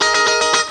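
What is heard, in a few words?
Jangle Guitar; Proteus 2000; Multisamples; Digital Sound Factory Proteus 2000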